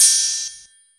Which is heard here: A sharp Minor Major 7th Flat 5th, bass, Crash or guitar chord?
Crash